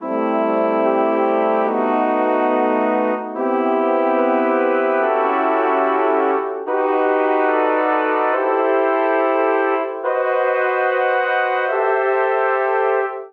Progression Breaking Bonds E melodic Minor 72 bpm, Brass Quartet; MIDI Clips; Ableton; Spitfire Audio